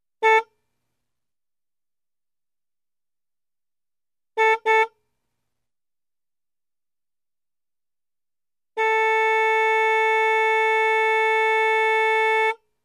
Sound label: Motorcycle signal